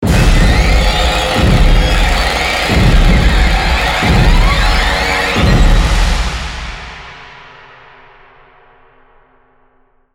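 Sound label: Sting Large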